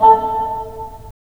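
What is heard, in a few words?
wind bassoon; LA Composer vol. 3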